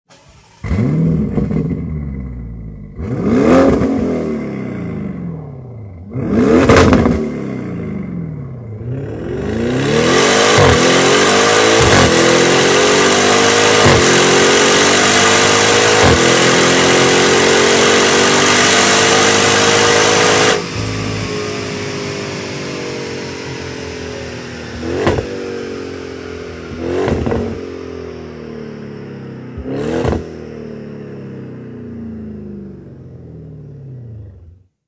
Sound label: Audi RS6 Avant